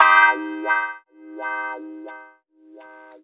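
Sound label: guitar, Roots Funk; USB Soundscan vol.41; AKAI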